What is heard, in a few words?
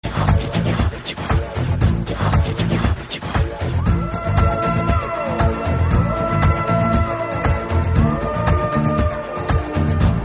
wired music